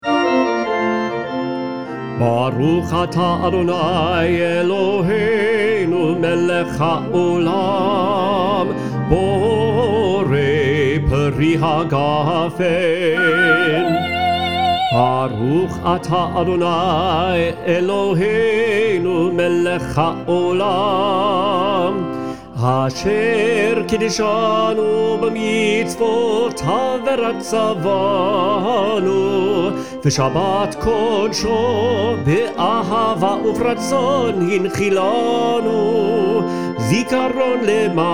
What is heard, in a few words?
Kiddush, SOPRANO